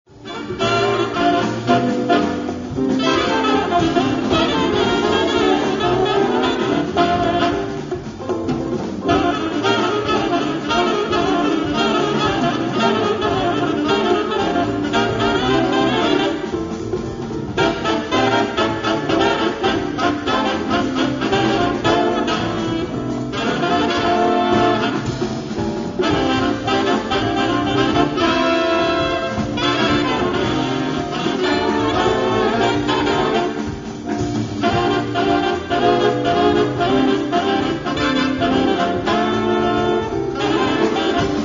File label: Sax